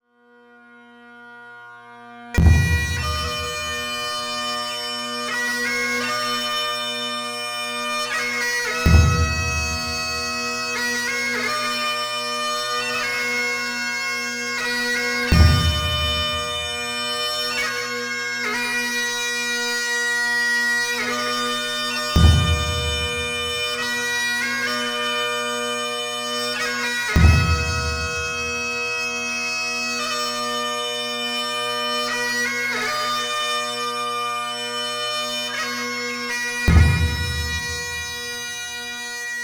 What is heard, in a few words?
Shema Israel Bagpipe Drum